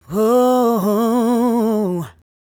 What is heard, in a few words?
"Whoho", vocal phrases; Ultimate Vocals